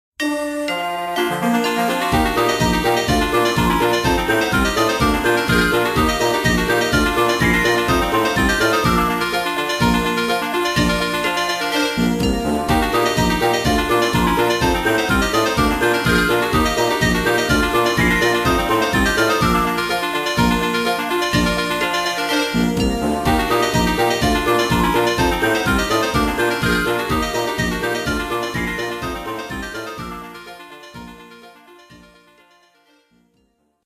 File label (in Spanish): Montañero tonada; Montañero DPPT